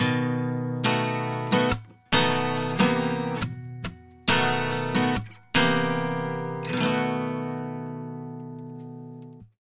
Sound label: 70 MAJ CHD B, Acoustic Guitar Loops; USB Soundscan vol.04; AKAI